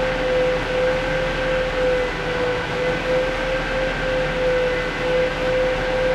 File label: centurion engine int rpm 99, tanks; engines; War Thunder